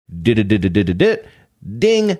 duh duh duh duh duh duh duh ding

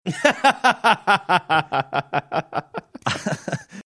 laughing chuckling

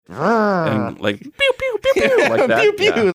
making jet ray gun sounds

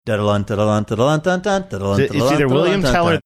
singing william tell overture

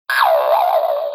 spring

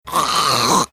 making a throat clearing sound

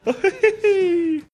laughing oh heheheee

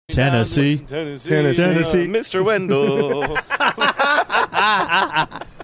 singing tennessee laughing

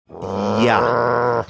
growling

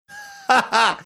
haha

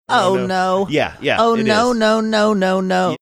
singing oh no oh no no no no no

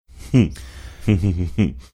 mumble chuckling

